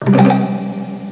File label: PLK SYNTH